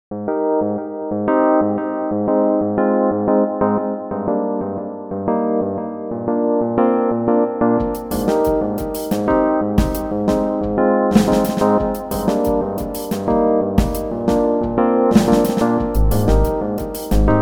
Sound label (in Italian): AL PIANO